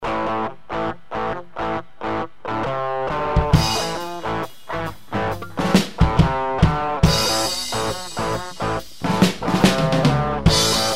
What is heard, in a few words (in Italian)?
BLUES STRUMENTALE